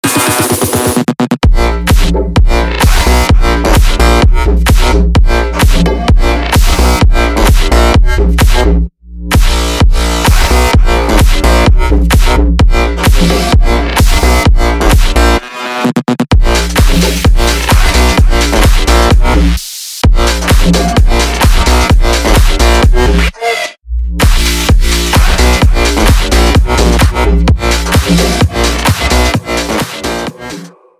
bass house

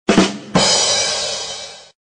ba dum tss; kot stendaper

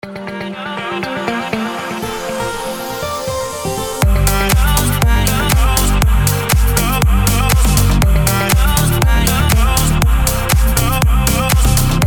atmo

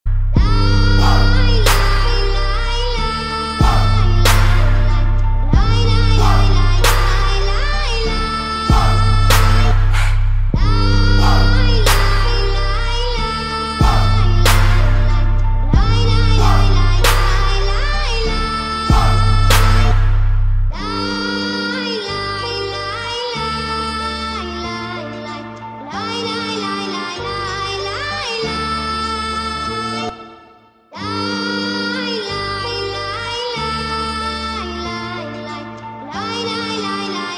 atmospheric trap

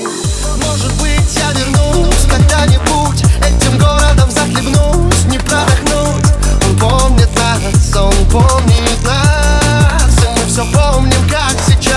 dnb remix

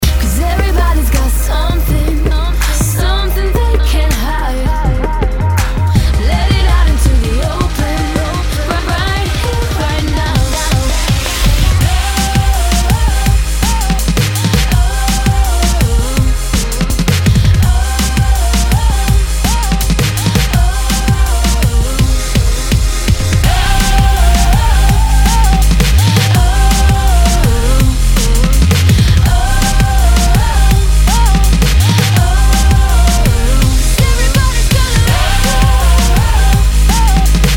drum bass

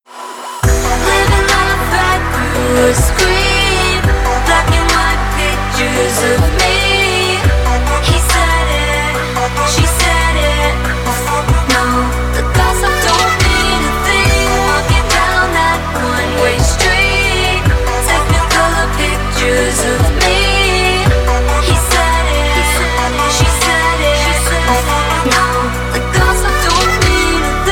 xylo

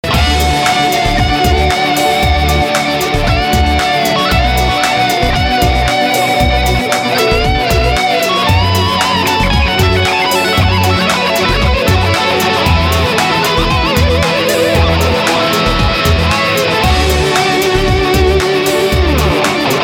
zulu rock

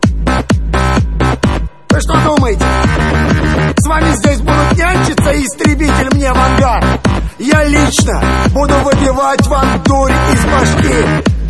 sms

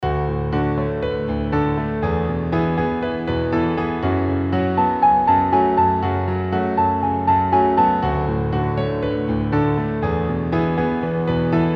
piano

